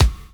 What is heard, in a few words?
BD, BASS DRUM, 70's Breakbeats